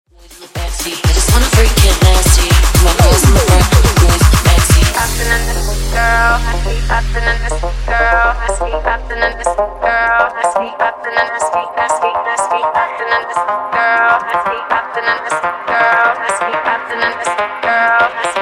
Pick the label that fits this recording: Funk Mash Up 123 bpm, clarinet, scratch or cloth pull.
Funk Mash Up 123 bpm